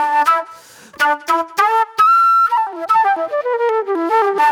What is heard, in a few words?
Agro Fusion Flute